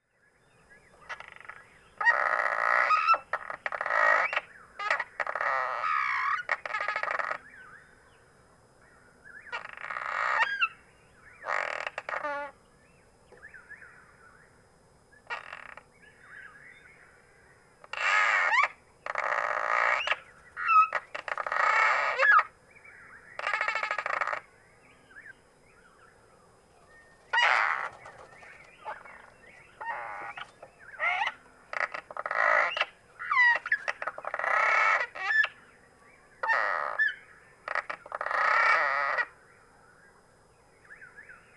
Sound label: Cliff Swallow; Songs at half speed. June 1, 2012. Graves Farm Wildlife Sanctuary, Williamsburg, Massachusetts.